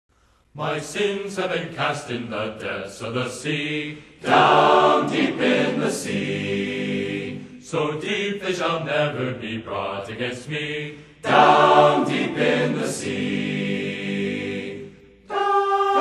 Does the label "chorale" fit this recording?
Yes